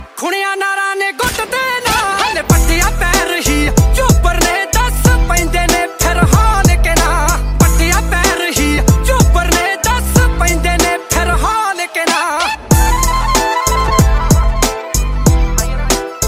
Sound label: Punjabi Song Ringtone